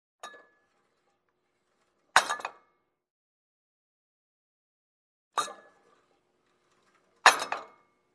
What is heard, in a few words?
Weightlifting; وزنه برداری